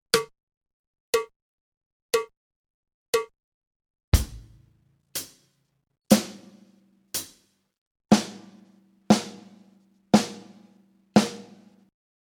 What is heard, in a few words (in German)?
Uebung, schlagzeug